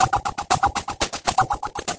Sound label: 120 chop backbeat, drum loops and breaks